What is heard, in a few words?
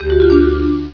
chat queue; gabber ooochat; BeOS